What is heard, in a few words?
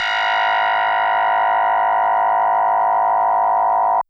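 DX100 G#0; rocket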